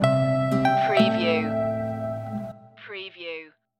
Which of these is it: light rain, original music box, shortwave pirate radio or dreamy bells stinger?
dreamy bells stinger